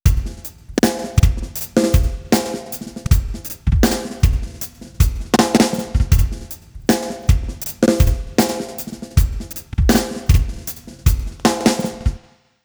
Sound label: drums, timestretch